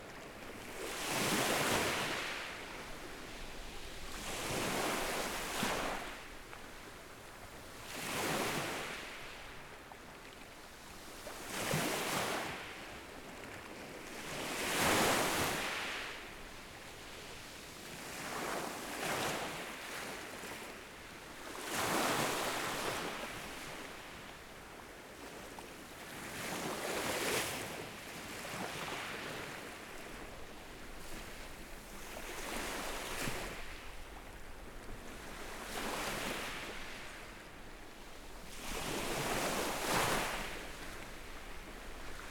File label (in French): extr anse du magaud, la mer; zoom